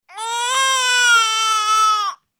Baby Cry

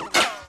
Twang; Ed Edd n Eddy; Adromulus Pack; EEnE

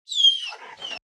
Dog - Whimper; Ed Edd n Eddy; Adromulus Pack

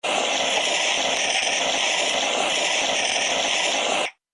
Fire Extinguisher

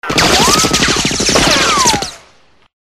machinegun ricochets, weapons

